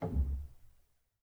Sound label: rel, Grand Piano; bitKlavier Grand; Piano Bar